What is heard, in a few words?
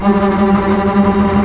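TREMOLO STRINGS G1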